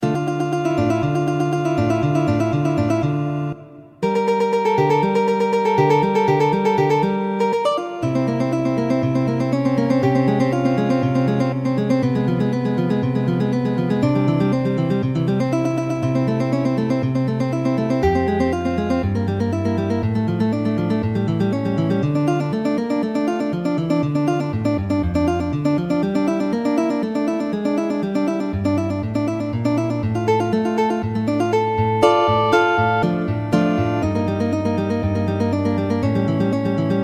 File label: capriccio guitar